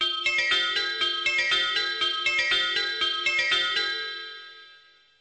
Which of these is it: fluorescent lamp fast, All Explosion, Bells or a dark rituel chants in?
Bells